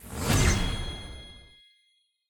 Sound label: small Win; netent; multipliermayhem